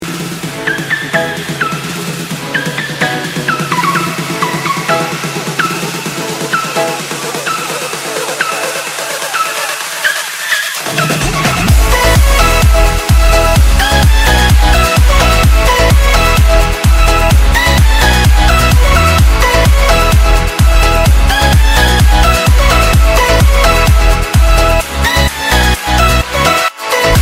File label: low quality music; roblox; memes